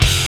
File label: GTR; Roland - LA Composer vol. 1